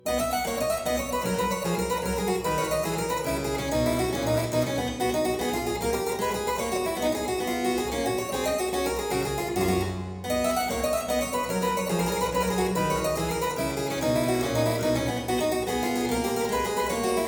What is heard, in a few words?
Aria in C major Variatio 4, Harpsichord; Buxtehude; Complete Harpsichord Music